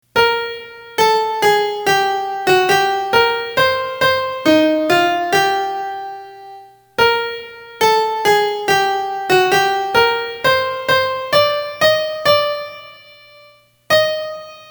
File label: fight song